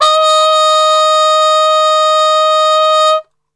D#3, Brass, TRP CUP MUTE; Best Service; Brass Super Section; 90 s Sample CDs

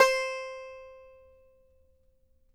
banjo C5, banjo notes; Sampleheads - Whole Lotta Country 1 & 2

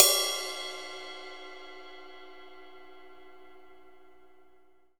Drum Dry; East Collexion; Drum 1

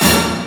HIT, synths; Rubber Chicken Software Sample Bank

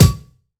BD, BASS DRUMS; EdgeSounds

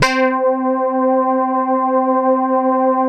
KITCHEN SYN; Greytsounds; Scott Peers Sound Engineering

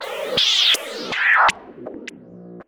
StarShip Sounds

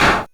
Drums, Hits; DCM8

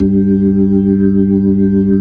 B-3, Jazz

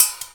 CANS, Percussion; InVision Interactive; Percussion Slam

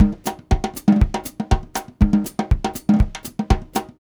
Voodoo Groove, Latin Percussion; Sonic Foundry (Sony Creative Software); Joe Vitale Latin Percussion; Grooves

